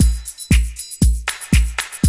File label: Loop, Roland; Sound & Vision - Gigapack I